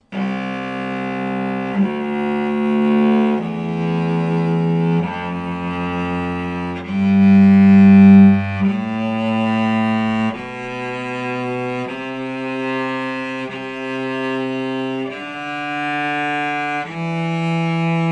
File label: Kreuzinger Tail Spike scale, cello